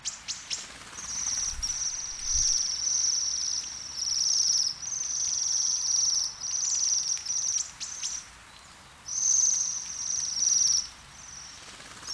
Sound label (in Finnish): lintuparvi